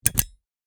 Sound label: clank button